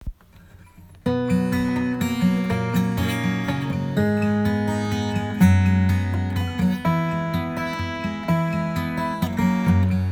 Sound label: Martin GJ12-40 finger